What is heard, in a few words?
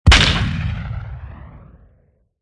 Sfx Baseball